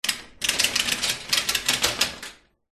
industrial chain medium movement scrape hoist over metal light room reverb